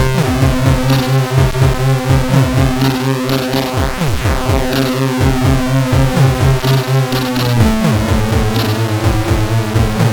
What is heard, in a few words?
esque big bass, MOS6581R4